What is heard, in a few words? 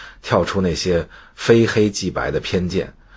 guns audio segments